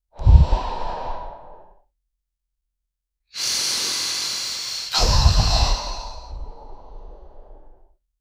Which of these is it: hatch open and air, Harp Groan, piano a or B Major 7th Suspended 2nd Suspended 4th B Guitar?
hatch open and air